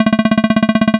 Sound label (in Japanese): 会話; nes retro talk sound effects